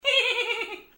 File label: giddy laugh